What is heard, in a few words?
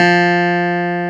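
clavinet F3; AKAI S-Series CD-ROM Sound Library VOL-8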